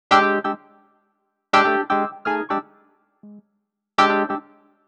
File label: 125 NuJazz Wurlitzer Emaj; E-Piano; Pro Tools Essential Loops; Digidesign